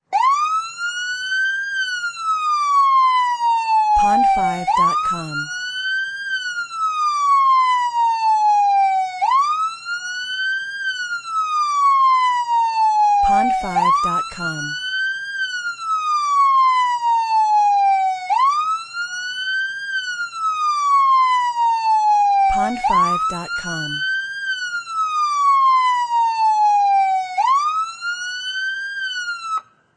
Siren

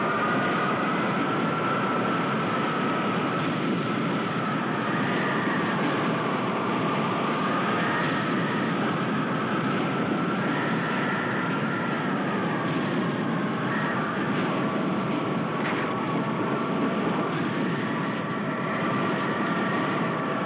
Wind tornado

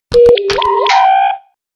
Transporter Buttons

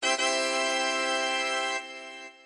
TaDa hi

